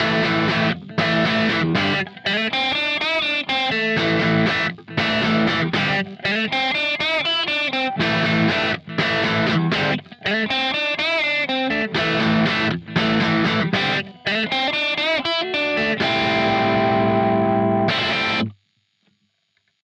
Overdriven JM45

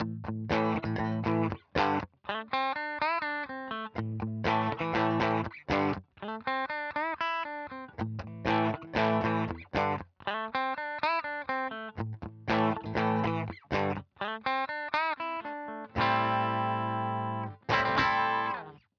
WaveSpecialAsh Rear

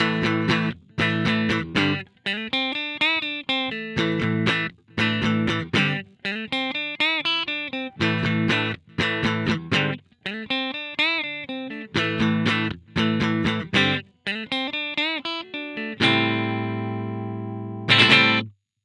Acoustic Sim V2

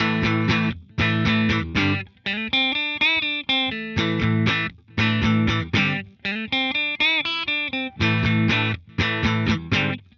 Dumble clean, test

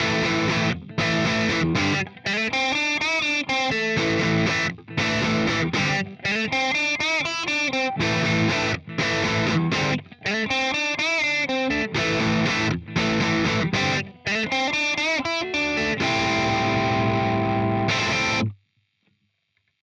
British Rock 50 V2

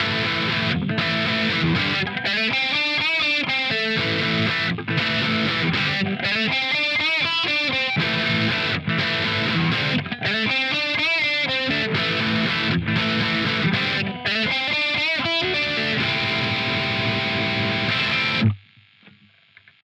German Fire V2

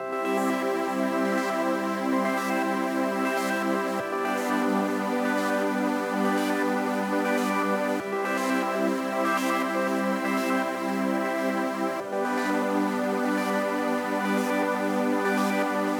Soft Pad; SynthMaster Player

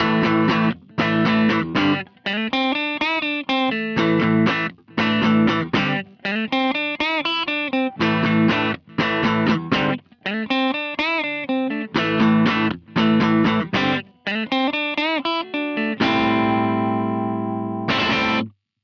SuperAmp

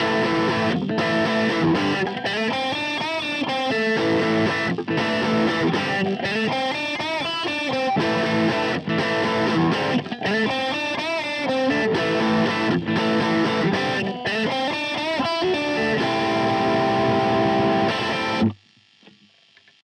British Lead 800

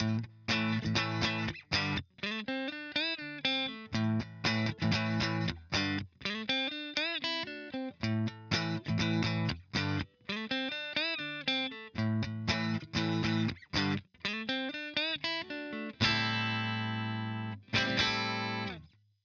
RosewoodSt Front

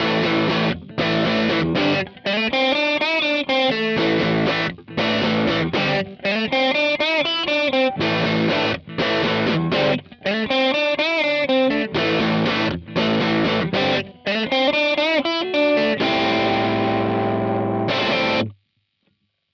PhD18

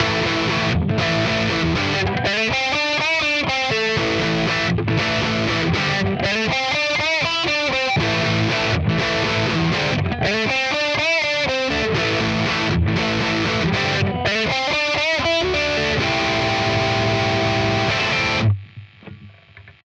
Insane6508

